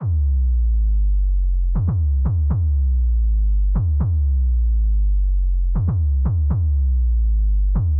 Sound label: stereo sub